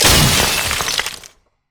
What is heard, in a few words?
ring of frost; LoL; lissandra